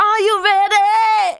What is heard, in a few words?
vocals sung; Techno Trance Essentials